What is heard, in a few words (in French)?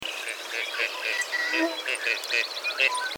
canard chipeau, chant